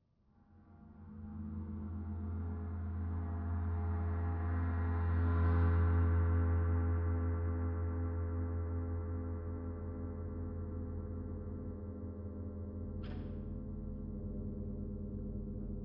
Mine Tension; Outlast 2; PS4 Windows Xbox One

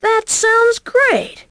Correct

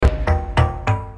Drums; hylics gamerip pc 2015; More Hylic Drums

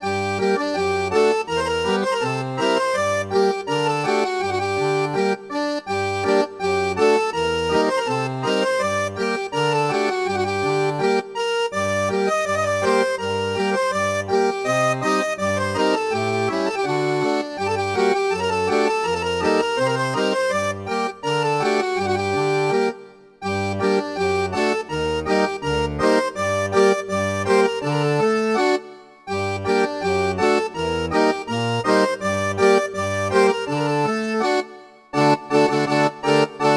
Town Tavern

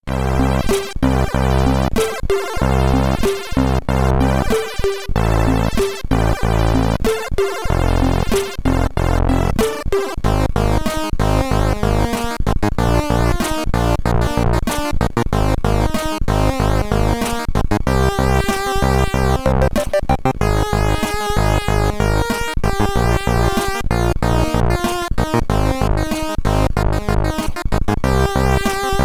Stage Clear, SID Stereo, commodore 64; Hard n Heavy

